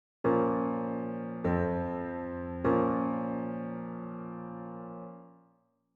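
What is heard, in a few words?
B flat minor perfect authentic cadence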